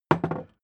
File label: hammer wood bounce